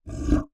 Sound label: animal gorilla grunt or vocalisation